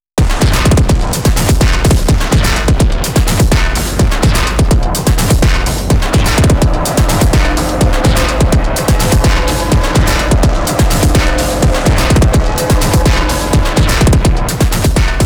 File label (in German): Digitakt Groovebox und Sampler Off Beat Techno mit und ohne Overdrive